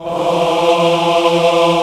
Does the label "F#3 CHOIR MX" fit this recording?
Yes